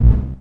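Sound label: hard digital button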